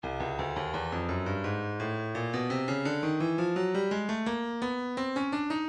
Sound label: breakbeat 085 bpm; Sultrifier C; Stylus Breakbeat Midi Files